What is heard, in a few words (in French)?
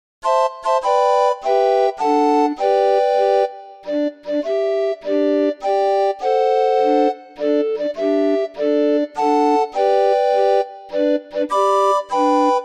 orgue barbarie